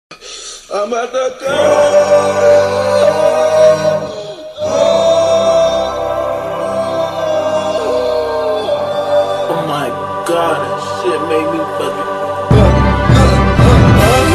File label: choir extended; ambatukam